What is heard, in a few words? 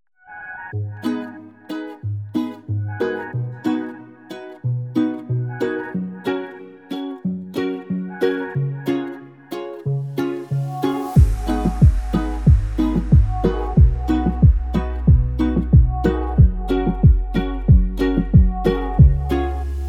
Ukelele for Reggaeton; Karlek